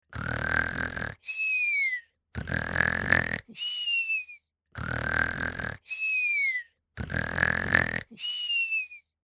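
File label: cartoon snoring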